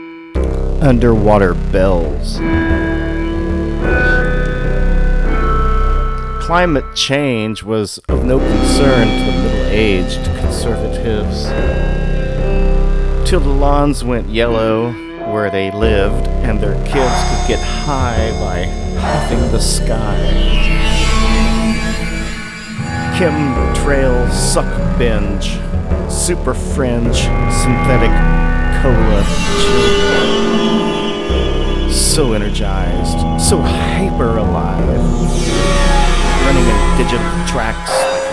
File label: Underwater bells